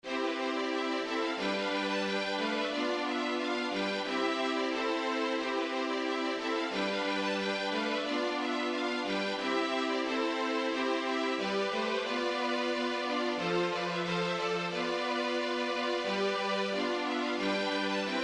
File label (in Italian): ballo francese en do